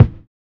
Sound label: LO FI BD, Drums Dance & Groove; LO-FI KIT; USB Soundscan vol.11; AKAI